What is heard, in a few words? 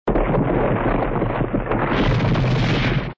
Grow Tremble; mystic training